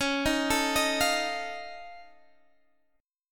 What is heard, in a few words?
F 7th C sharp; F7/C#; arpeggiated